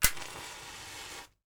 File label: matches